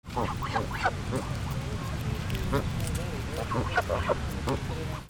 BIRDS

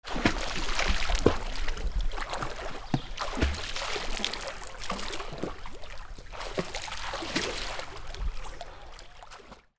WATER

